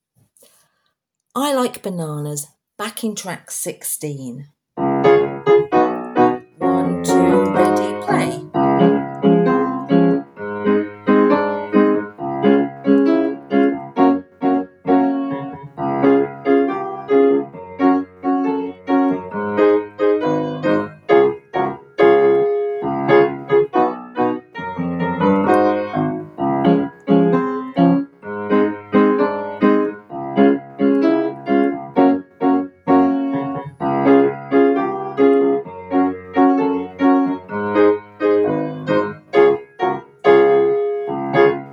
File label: backing track; keyboard and piano resources